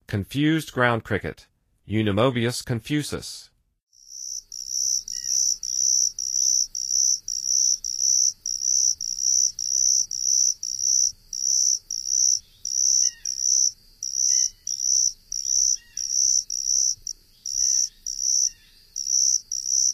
Confused Ground Cricket, Songs of Insects

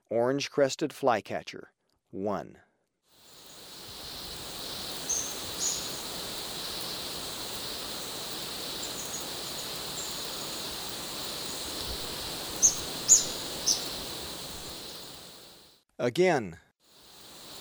Orange-crested Flycatcher, Birds of Ecuador; S. America

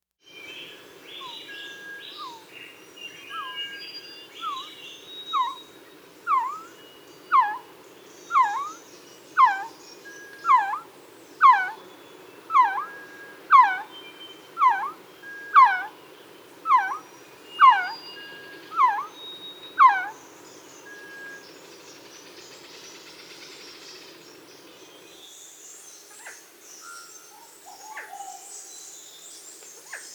Male song, perched and flight call, whistle call

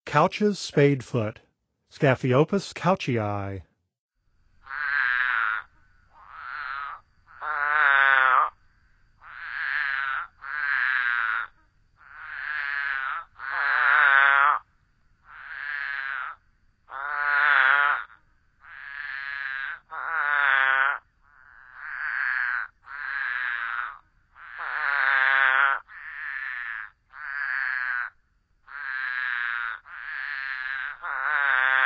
Couchs Spadefoot; North America; Songs of Frogs & Toads of North America

